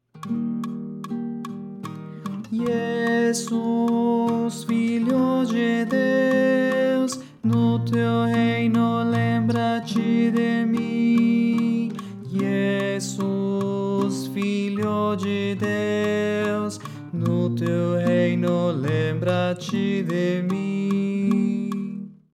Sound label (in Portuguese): Tenor